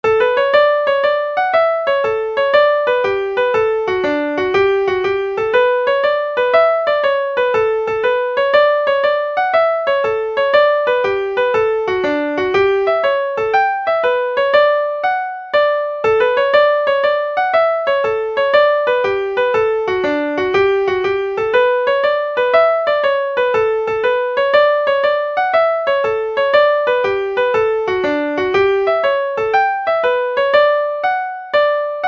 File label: hornpipe; jaunting car